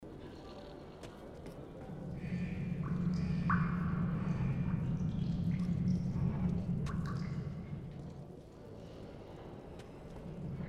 sound schwelle; Metro 2033; gamesoundopedia

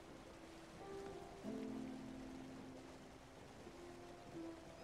Wasser; spiritfarer

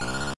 drachenfeuer; Dig Dug

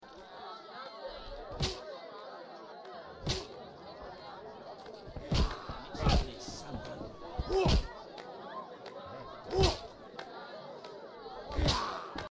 raum drinnen; The Witcher

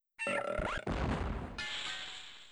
spaz tod; jazz jackrabbit 2

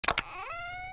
truhe; Stardew Valley

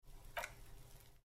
dialogoption auswaehlen uisoundsample

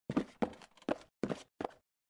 laufen; The Dream Machine

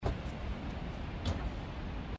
einsteigen; inside

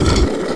Steinblock; Tomb Raider PS1; lara

